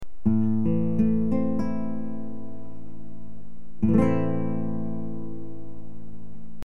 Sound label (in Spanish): acorde la menor, guitarra